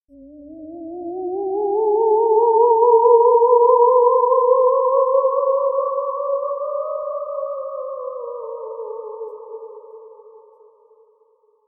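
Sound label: sounds of scary voices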